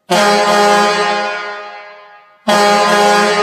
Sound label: Hadley Double Aluminium, double tone